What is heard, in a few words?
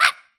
Bird Green Damage; fowl